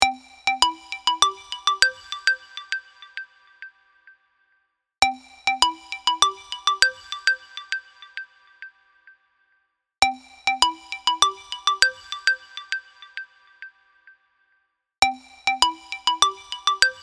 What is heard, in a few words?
phone ringtone sound